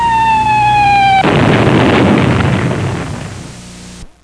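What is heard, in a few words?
bomb burst; warbirds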